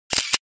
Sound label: Camera shot